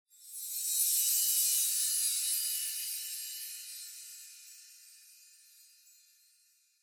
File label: Onoma Sparkle Short